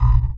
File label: BUTTON